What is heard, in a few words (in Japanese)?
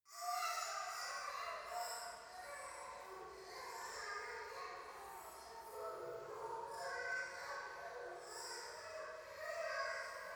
夢の中 シャブ喘ぎ 雨